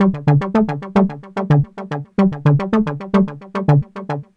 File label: Lead